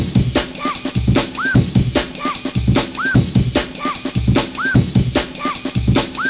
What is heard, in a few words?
breakbeat